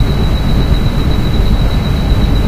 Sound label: steam drum; Source Engine; tf